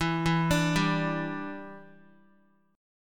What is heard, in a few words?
E Minor 7th, down, Guitar; Em7; arpeggiated